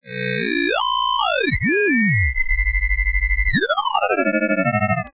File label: Vox; The Weird Side Samples; 99Sounds